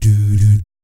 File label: DOO DOOT, Jazz FX; Spectrasonics; Vocal Planet; JAZZCOMBO